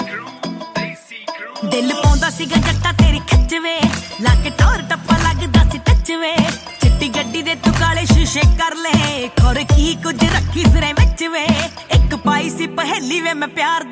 Punjabi Cutting Ringtone

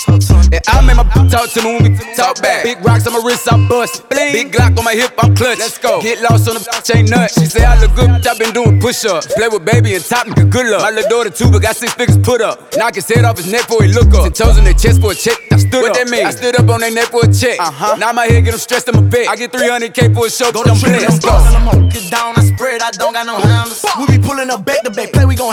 English Song Ringtone

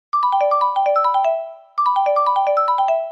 Htc One X Ringtone

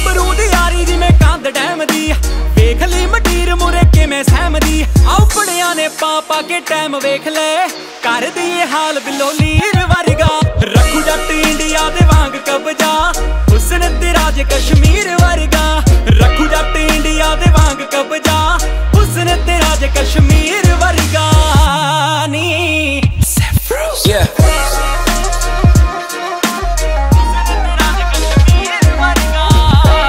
Punjabi Song Ringtone

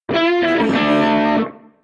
Guitar Message Tone

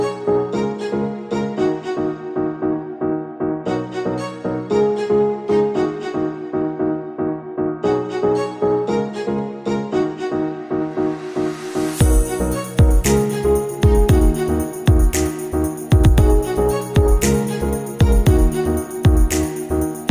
ringtone iphone

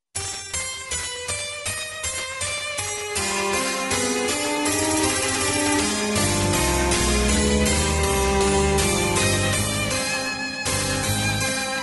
Love Incoming Call Ringtone

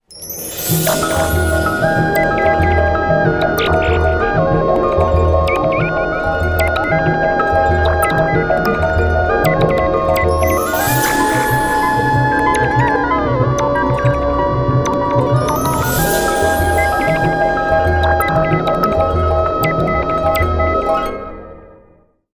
Ringtone for Phone

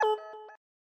Samsung J2 Core Notification

